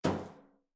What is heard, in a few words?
Metal Footfall; soundscrate